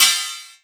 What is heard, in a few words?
Crash Cymbal